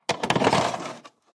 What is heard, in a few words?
VHS takeout